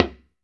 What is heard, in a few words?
TALK DR, TALKING DRUM, Africa